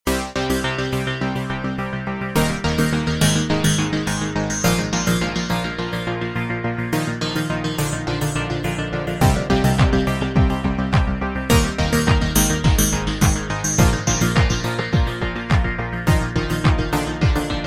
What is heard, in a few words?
SYNTH BUS